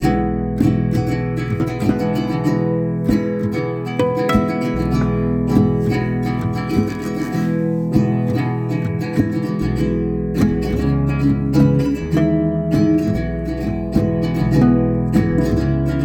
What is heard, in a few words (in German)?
Gitarren Sachen